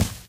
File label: run tile, footsteps